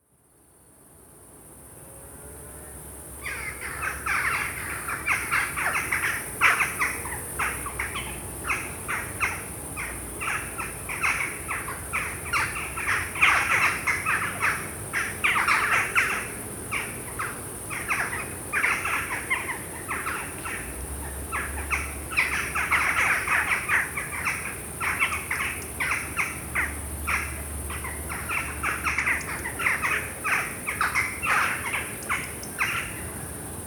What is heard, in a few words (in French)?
paroles choucas, Une dernière discussion avant le coucher.; Carsac; le choucas des tours; Paroles de choucas (1 mn) Carsac, le 17/07/2022 Une dernière discussion avant le coucher.